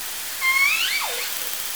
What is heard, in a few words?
S 12 (also), OrcaCalls, SRKW; Alwin